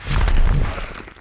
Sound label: burn